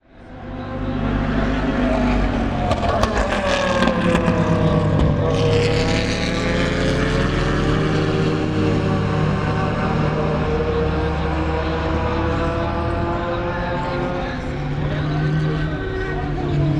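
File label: various cars after esses sunday, le mans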